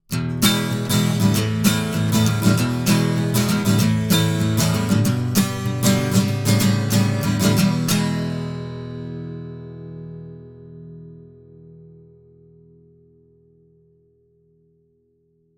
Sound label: Warm Audio TB12 Acc Gtr x18 clean nickel